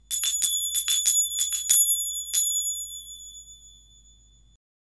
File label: arabesque german silver zils